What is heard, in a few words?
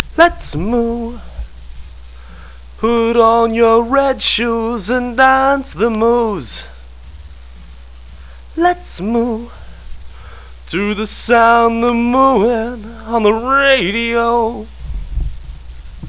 lets moo; hall of moos